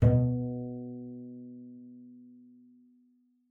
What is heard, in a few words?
B2, bass pizz; Xsample VOL-5